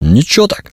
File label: reaction music; STALKER